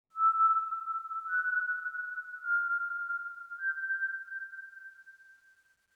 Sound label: Oneshot Synth